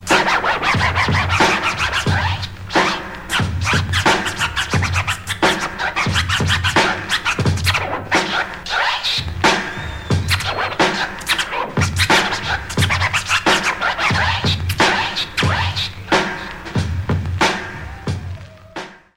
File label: scratch